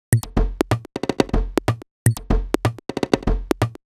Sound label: Top 124bpm, House Drumloops; Zero-G House Fabrik; 90 s Sample CDs